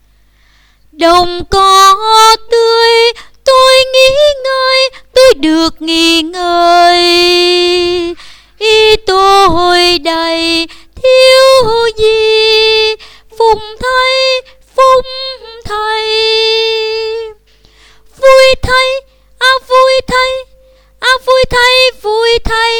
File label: Tenor